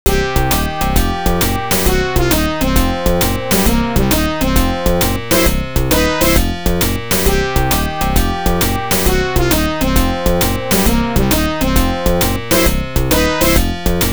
electrobrass, An uptempo jazzy loop with a bit of a noir detective vibe.